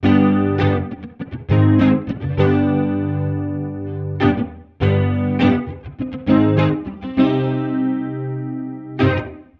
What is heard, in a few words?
Xmass Guitar Loops 100bpm Gmaj; Guitars; Ghosthack; Advent Calendar 2019